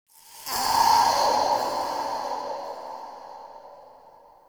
Interior Texture High Vain Squeeze; Ambience; Indoor; Warframe; Infested Eris

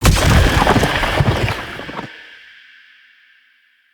Fire Rain Hit; Powers; Fenn

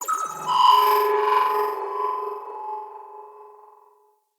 Reinforce Beacon Call; Enemies; Reinforcement Beacon; Venus

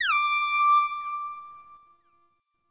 Hip Hop Pack

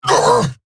Pain, Battle Barks; Warframe; Eidolon Grineer

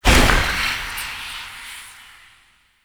Tailor Shop Door Fade; Warframe; Ambience; Nineteen Ninety Nine; Interior

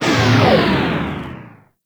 Proj Large Fire; Warframe; Lizzie

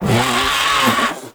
Combat Vocal Death; Warframe; Catbrow

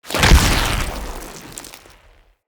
Root Spider Mother Web Shot Proj Burst; Animals; Spiders; Abilities

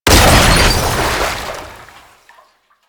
Sabotage Coolant Pipe Burst; Warframe; Missions

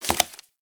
Investigate Putdown Card; Warframe; Cephalon Weave

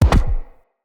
Bow Shot Charged Punch; Weapons; Dendrit Bow

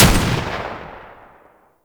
Shield Lancer Tutorial Pistol Fire; Warframe; Awakening Remaster

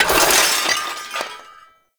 Bone Destructible; Ambience; Gameplay; Sentient; Warframe; Tau; Destructible Dynamic

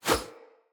Hunter Bow Melee Arrow Whoosh; Weapons; Player; Bows; EnvoyHunterBowRangedMelee

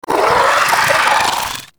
Vocal Attack; Warframe; Moa Satyr

